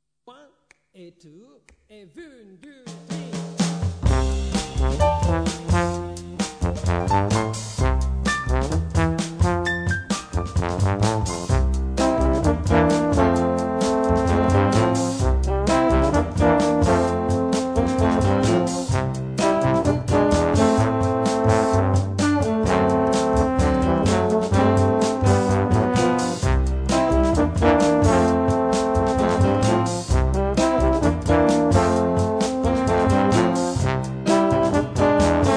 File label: Trombone